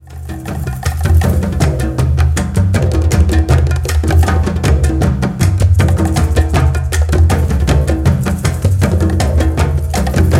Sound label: sacred beat meeting